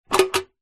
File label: household tin jar teabags lid movement